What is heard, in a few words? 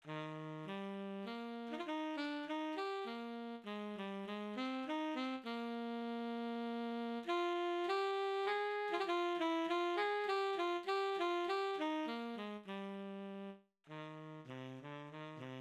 tenor saxophone